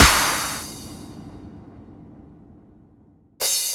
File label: Clap Crash, EDM; Sonic Foundry (Sony Creative Software); Afterhours EDM